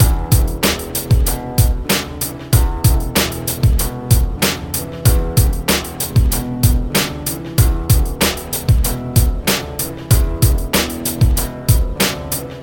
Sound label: Rap Beat Melody